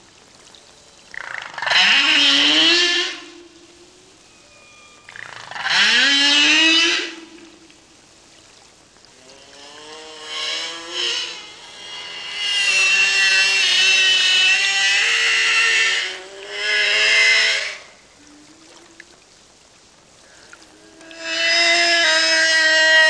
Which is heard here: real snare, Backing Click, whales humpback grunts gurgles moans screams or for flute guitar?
whales humpback grunts gurgles moans screams